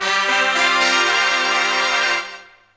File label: Fanfare; Rival Schools United by Fate; PS1